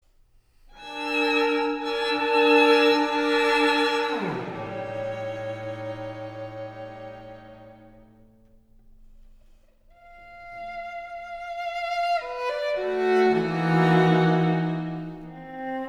String Quartet Majestic Dancing Majestic

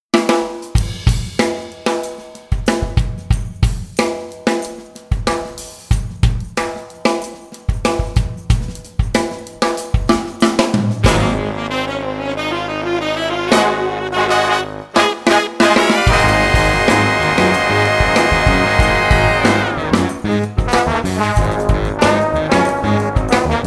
Sax

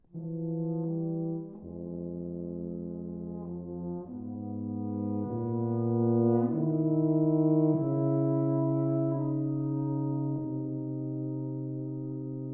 For Horn And Tuba, Lament

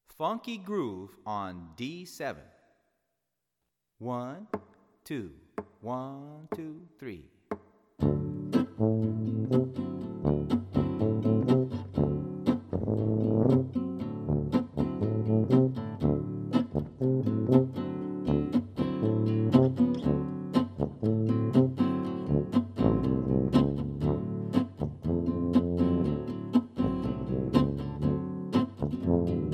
D7 Funky Groove

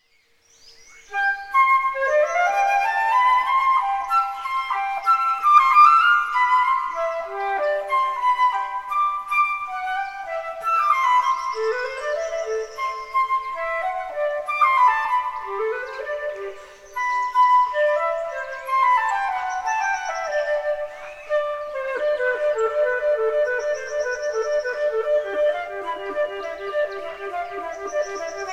Suite in C Major, Gigue; Suite No. 3; BWV 1009

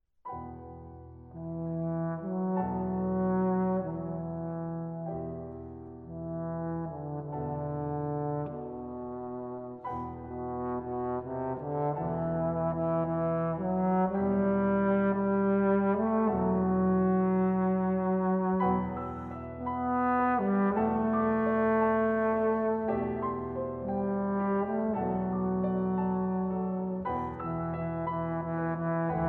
Movement For Trombone & Piano

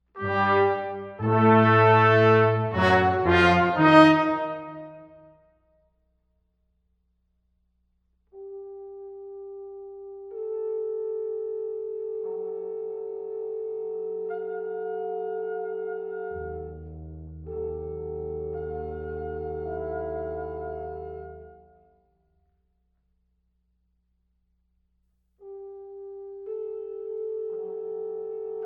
Nonet For Brass

